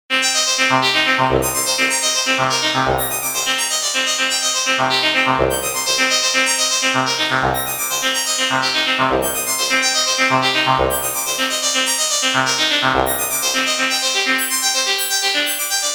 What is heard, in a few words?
Mini Brute V Arp; Simulatrix